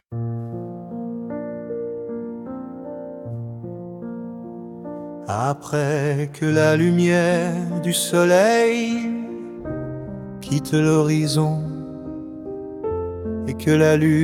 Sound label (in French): berceuse homme; BERCEUSE (VOIX HOMME)